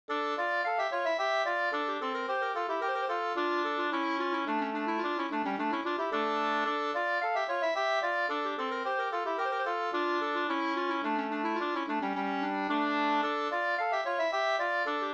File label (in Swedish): slangpolska 2st